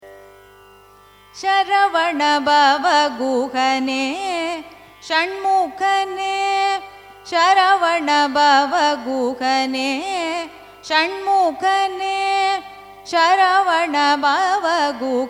raga kannada carnatic

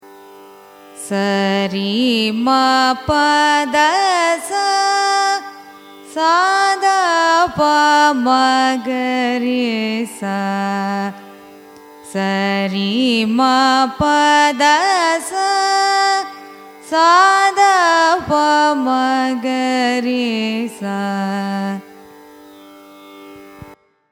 raga sama arohanam avarohanam; carnatic music

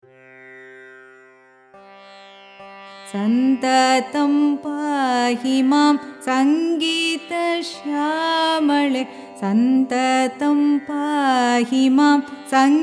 song shankarabaranam rupakam; carnatic music; santatam pahimam; dikshitar

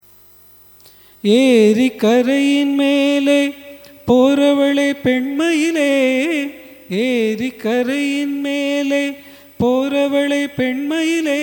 raga arabhi cine

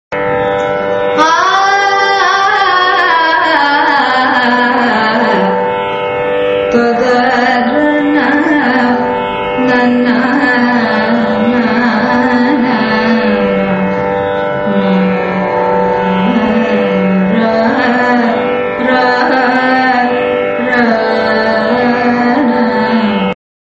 briga, carnatic music

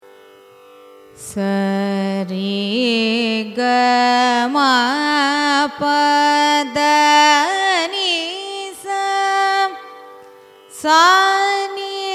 raga kalyani arohanam avarohanam; carnatic music

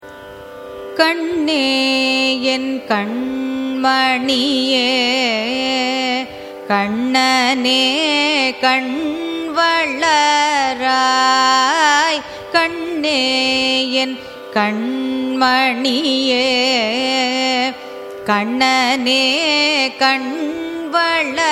raga kurinji carnatic; carnatic music

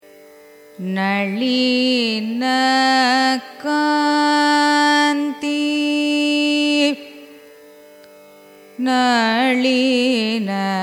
raga nalinakanthi signature, carnatic music

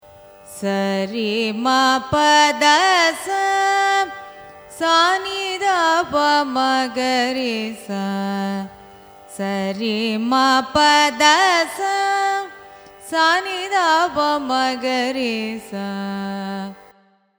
raga arabhi arohanam avarohanam; carnatic music

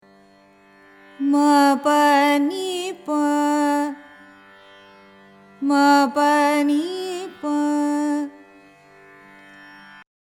hamsanadam, carnatic music

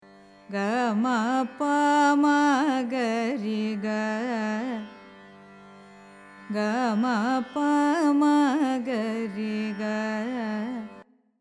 ananda bhairavi, carnatic music

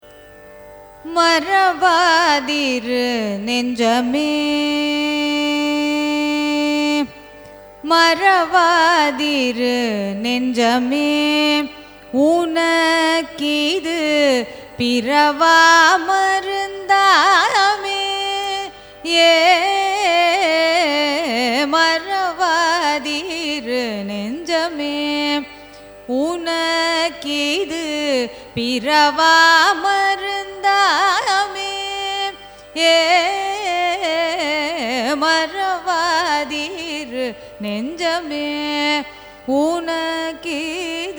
raga malayamarutham carnatic; carnatic music

